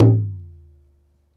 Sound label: lower hit, tabla; Dirt-Samples